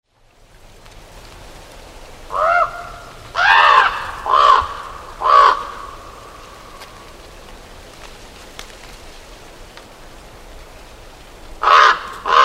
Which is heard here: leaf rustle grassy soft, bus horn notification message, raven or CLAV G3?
raven